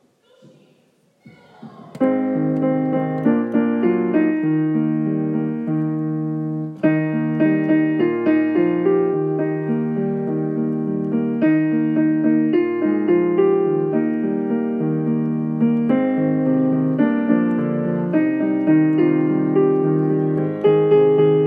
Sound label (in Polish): Żaby kumkają od rana akompaniament